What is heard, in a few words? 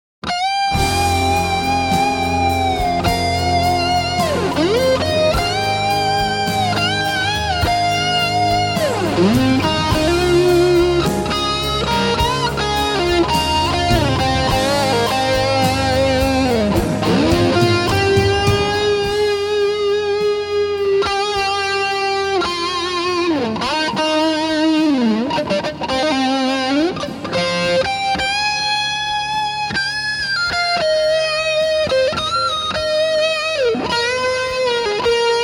solo EMG Strat, Bassman; GR Muff; blue Legend 1028P